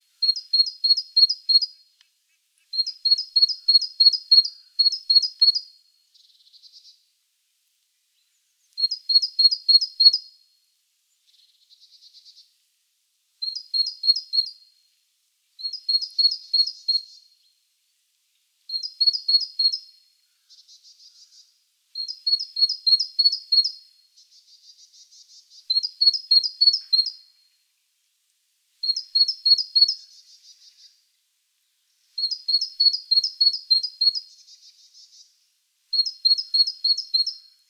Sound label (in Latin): Parus major; SETE